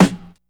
long room reverb steel snare drum sound F sharp key